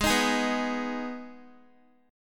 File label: Ab Minor Ab down Guitar; strummed